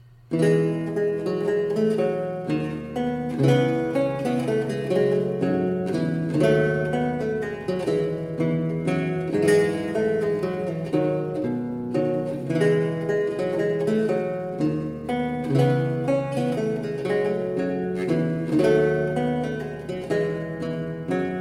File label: menuet